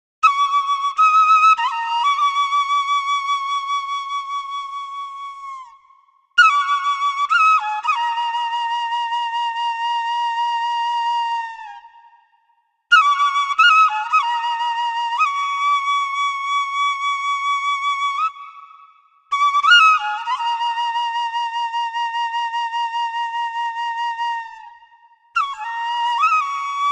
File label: Chinese High Membrane, wind instruments; wind instruments from around the World; Andy Findon; Ancient Treasures, exploring wind instruments from around the World